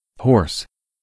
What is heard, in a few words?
horse